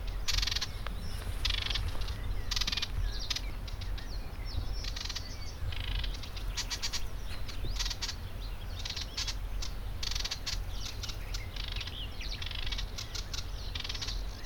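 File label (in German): Seggenrohrsänger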